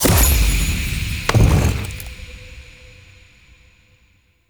glyph puzzle push action success; Warframe; Duviri

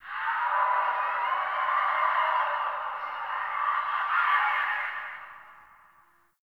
Distant Infested Screams Winter B; Warframe; Ambience; NineteenNinetyNine

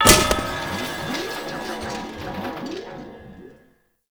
Target Dummy Impact; Warframe; Duviri; Waiting Room

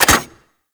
Colonist Rescue Key Insert; Warframe

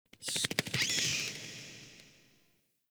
Interior Cave Bat; Warframe; Duviri; Ambience

